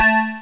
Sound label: chink; Atari Mega Archive